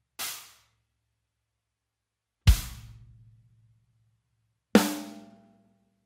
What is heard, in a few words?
MEINL DEEP